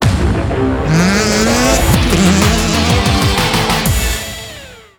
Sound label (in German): soundlogo; rallye tv